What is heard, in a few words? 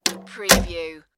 apartment door close